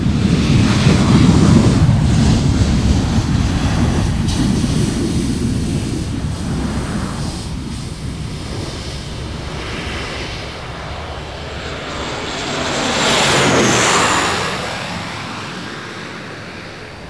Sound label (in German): FLUG